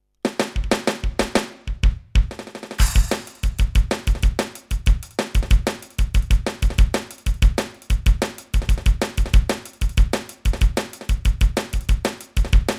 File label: DNB